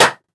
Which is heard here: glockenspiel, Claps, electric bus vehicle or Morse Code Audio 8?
Claps